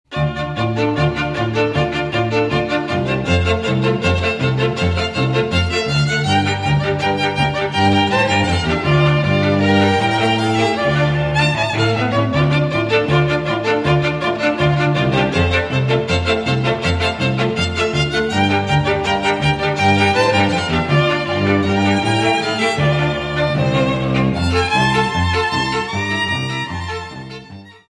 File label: Siman Tov Traditional Jewish, String Quartet